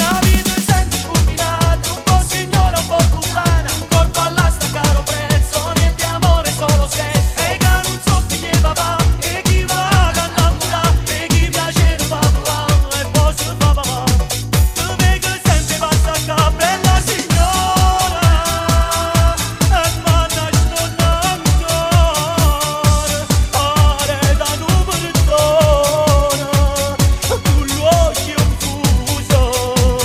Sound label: remix dance